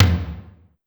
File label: Rock Tom Hi, Clavia DDrum AT, Pitched Down